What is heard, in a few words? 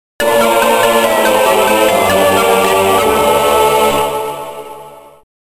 Mission Completed Item Obtainment Jingle; Metroid Prime Hunters